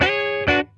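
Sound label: E9 SLIDE, Funk Guitar; 90 s Sample CDs; Zero G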